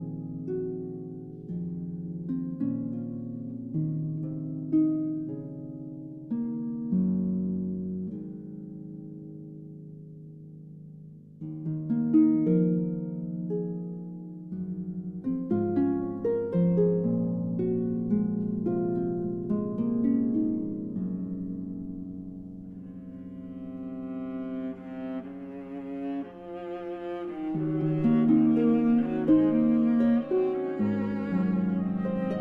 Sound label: Harp Concerto Lullaby